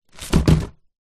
present wrapped box fall over; zapsplat

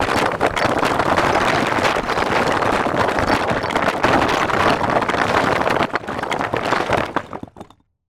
Crash Debris Rocks; Blastwave FX

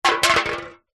drink soda can empty drop knock over wood deck; zapsplat

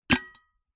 foley soda can tin stack empty stack on another

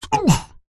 human male vocalisation pain short ohh soft; zapsplat

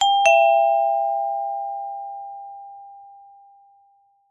doorbell chime ring; household

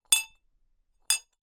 bell school handheld ringer hit bell x2 muted

